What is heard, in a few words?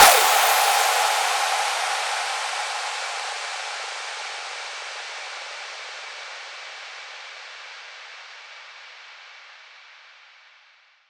Fx Downlifter, 170